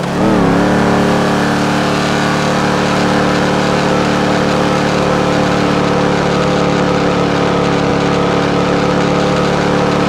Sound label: dukes second, simulated vehicles; gta5 dukes; rp rockford; simfphys base